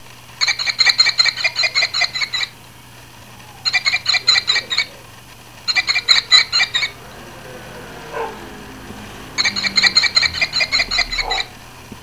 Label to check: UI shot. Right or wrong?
Wrong